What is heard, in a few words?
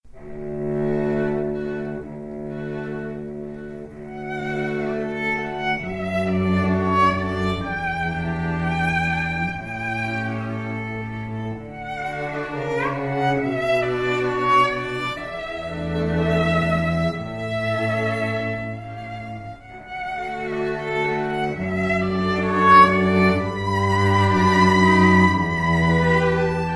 strings